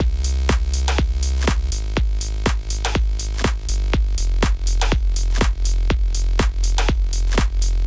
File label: Backing